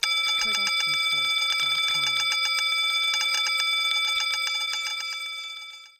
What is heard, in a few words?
school bell; soundscrate